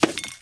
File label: Hit Hand Fall Drop Syringe; SFX Kit; Foley - Misc